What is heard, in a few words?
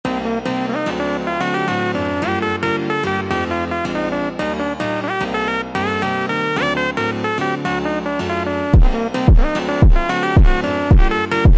Funk super Slowed